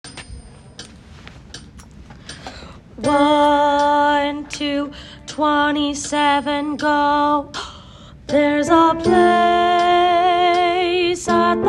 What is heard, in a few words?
candle is a symbol soprano